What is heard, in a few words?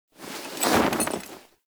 Camp Canopy Flap; Quests; Veilbreaker; Kahl Camp